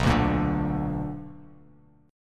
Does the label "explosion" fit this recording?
No